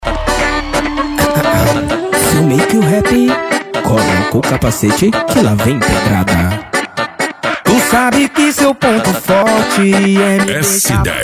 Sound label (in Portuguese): sertanejo